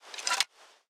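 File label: weapon police baton unequip; Wpn policebaton unequip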